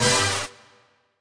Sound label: Quiz Answer; New The Denpa Men